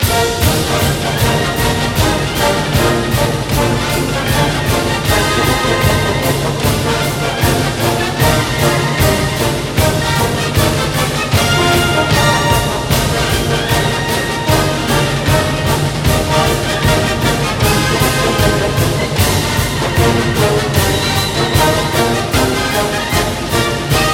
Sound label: Fight Tune; ncaa basketball 10; ps3; xbox 360